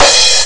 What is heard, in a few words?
crash super long; Amiga Soundtracker Sample Packs